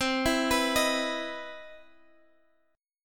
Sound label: C Major 7th Sharp 9th C, chord